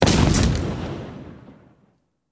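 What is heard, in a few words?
Explosion Wood Debris; wood & sand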